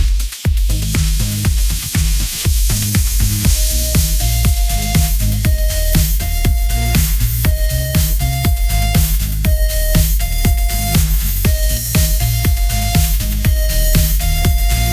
upbeat pop melody, ringtones

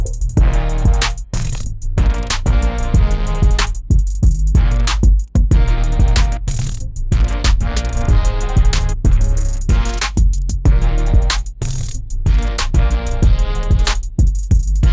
hip hop beat, music

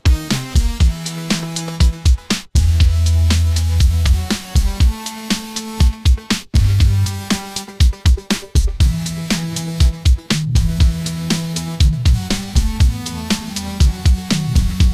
upbeat pop melody, ringtones

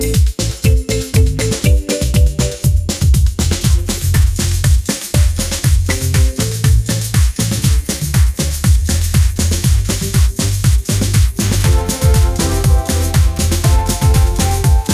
upbeat pop melody

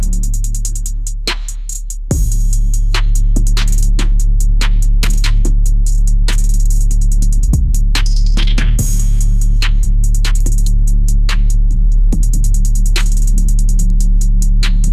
cinematic elegance trap symphony, ringtones

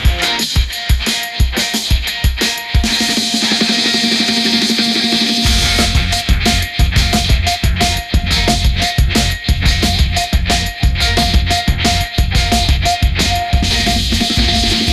energetic rock, ringtones

